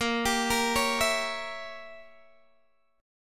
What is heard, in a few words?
A sharp Diminished 7th, Guitar Open G; x,15,17,15,14,14; arpeggiated